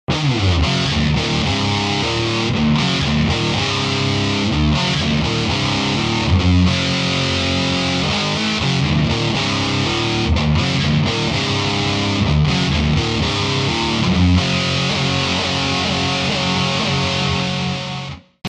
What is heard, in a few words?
badcat double gain